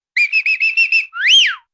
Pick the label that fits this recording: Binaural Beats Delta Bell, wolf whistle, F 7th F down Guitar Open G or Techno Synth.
wolf whistle